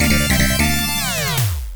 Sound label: Stage Clear; TechnoSoft; THUNDER FORCE III & AC